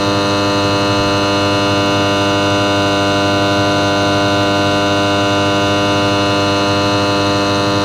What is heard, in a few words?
Light Hum